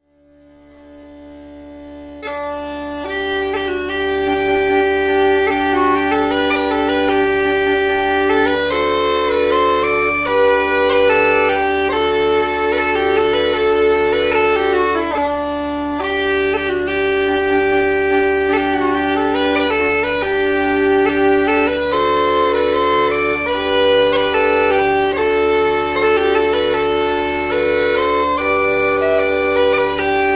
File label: breton wedding march, piper